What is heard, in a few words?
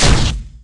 Laser Impact; Space; Ranger